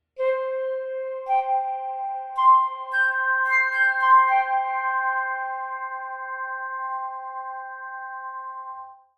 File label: flute, Petrof 275